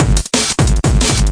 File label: 181 hollywood, drum loops and breaks, 181 bpm